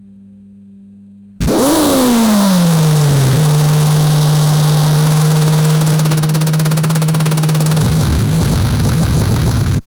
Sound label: formula 1 car engine cold; motorsports